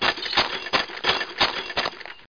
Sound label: WALKOUT; davidson